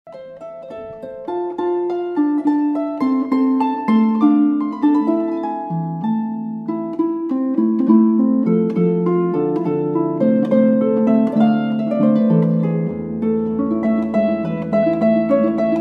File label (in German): Trailer Harfe